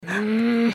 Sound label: groan; pony; Twilight Sparkle